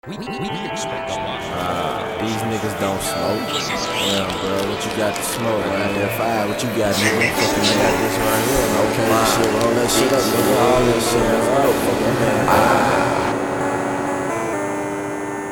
example funk

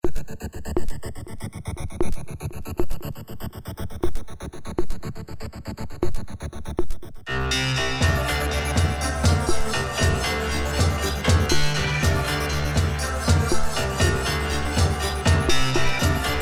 electro

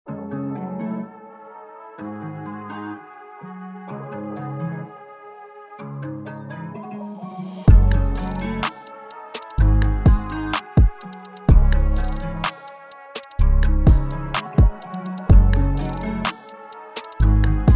guitar; Linkup

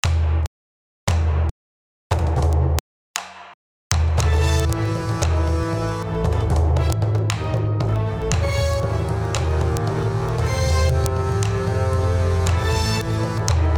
Battle March

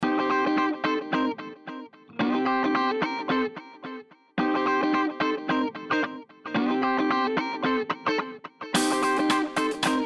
Jazz music

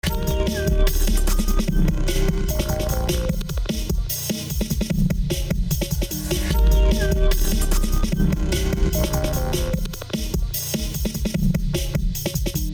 dnb

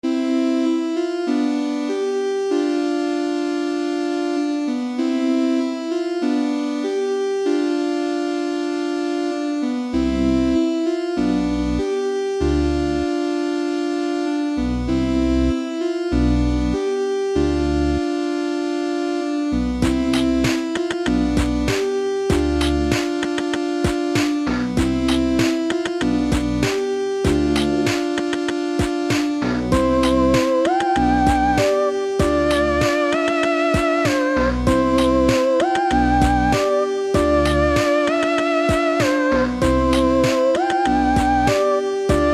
undertale type ost

